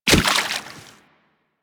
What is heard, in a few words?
Step Water, Footsteps; Warframe; Kubrow